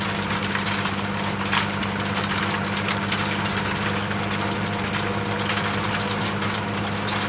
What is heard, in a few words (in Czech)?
korba; Mafie 1